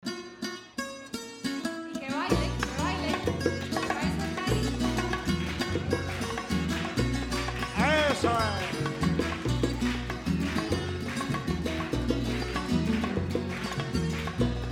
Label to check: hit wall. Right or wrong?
Wrong